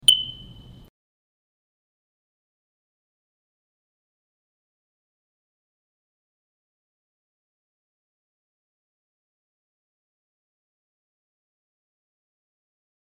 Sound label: Low Battery Chirp 4 chirps